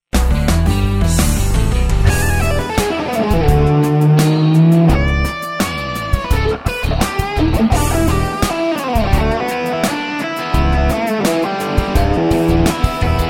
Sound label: CROSS ROCK SOLO